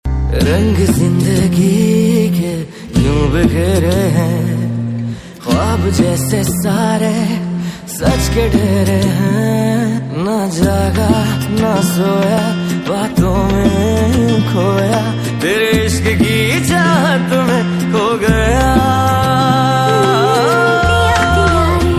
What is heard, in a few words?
pakistani ringtone